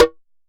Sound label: SABARO HITS; Best Service; World Colours